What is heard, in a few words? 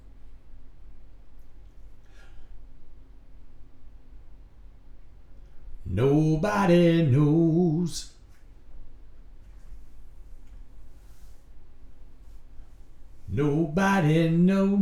vocals